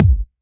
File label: Kick, Drum Hits